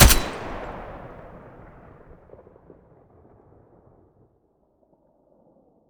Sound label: ak12 muzzle mono, weapons; STALKER; IWP